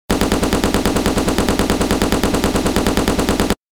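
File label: machine gun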